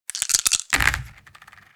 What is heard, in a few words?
Board game map dice toss and roll; leisure